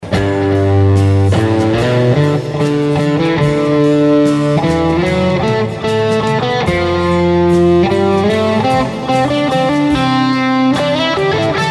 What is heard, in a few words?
neck bridge FAT; Telecaster custom relic